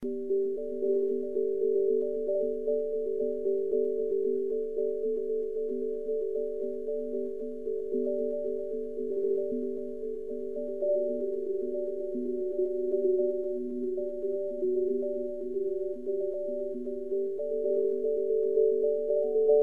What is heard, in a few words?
bg music; usbloadergx